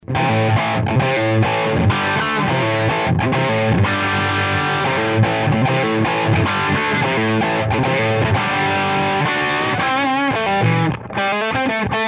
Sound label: Pico Plexi Head; miniature tube amp; It uses a 12AX7 in the preamp